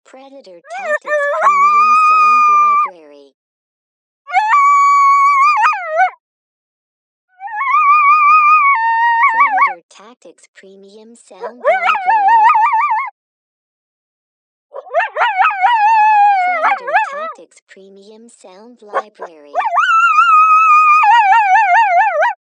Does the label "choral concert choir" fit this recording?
No